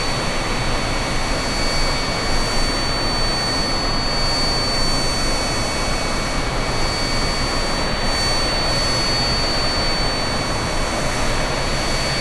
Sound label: Aircrafts Engines Engine TV3117 L; DCS